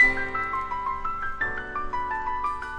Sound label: 172 two chord piano arpeggio; SAMPLED MUSIC LOOPS